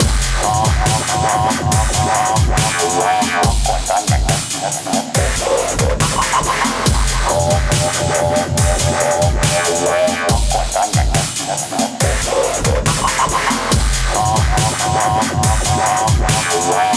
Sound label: Electro; lego star wars the skywalker saga; ps4; xbox series xs; Gonk